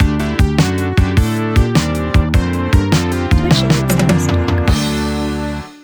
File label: New Wave Donation Alert